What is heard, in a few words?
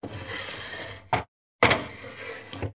Wooden Drawers, Open, Close; lilesound